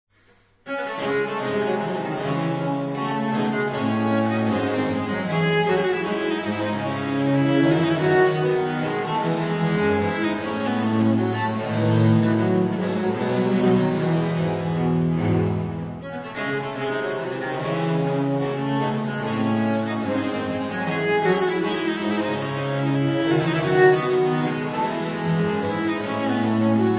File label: Courante; Marais; D moll